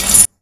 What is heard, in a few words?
KIT, Dance Mega Drums, HIP; 90 s Sample CDs; Best Service